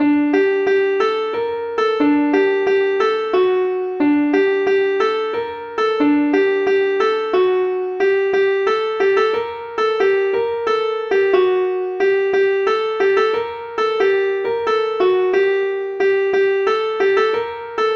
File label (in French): rond sautron